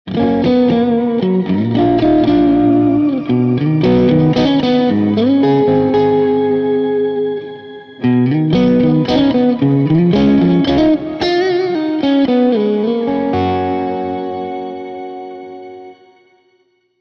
Fender Road Worn 60s Strat, Carvin Legacy III s, Eventide H9